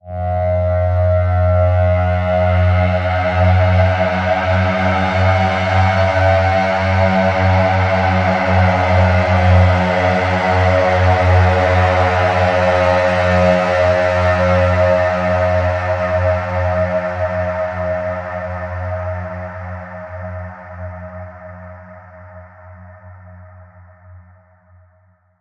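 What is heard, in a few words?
Bend; Reaching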